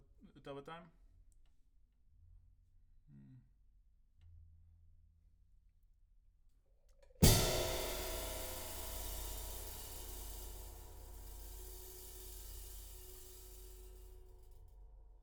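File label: OH, overhead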